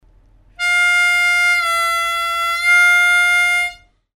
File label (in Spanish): bend asp, armonica